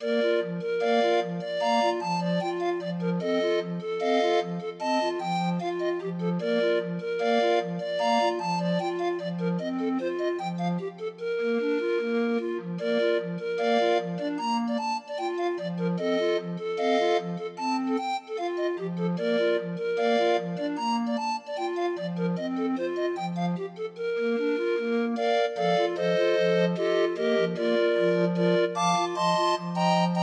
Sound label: Grind Organ